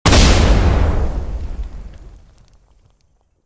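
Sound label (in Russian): точный артиллерийский выстрел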